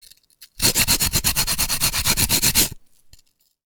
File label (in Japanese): 大根をすりおろす音 その2